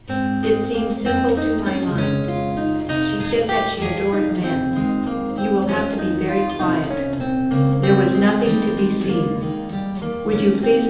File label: gitar